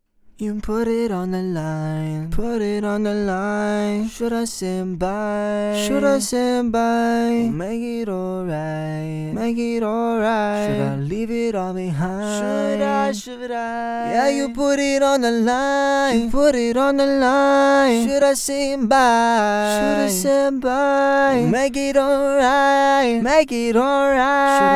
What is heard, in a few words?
Sing Pop RnB 97 Db, Acapella